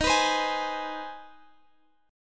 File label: C Minor Major 7th Sharp 5th Eb